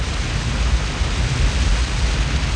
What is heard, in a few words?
waterfall